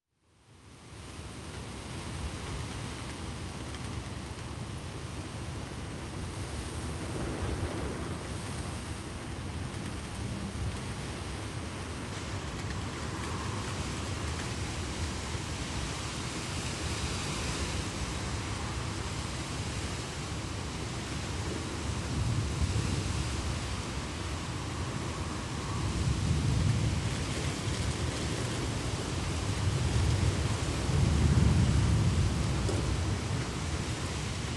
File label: heavy wind thunder rain; sonniss gdc